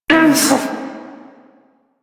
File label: Struggle Short; New War